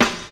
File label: dirty hip hop snare sample a sharp key; 90s; snare drum samples